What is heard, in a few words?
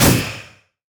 item glacial spike; LoL; items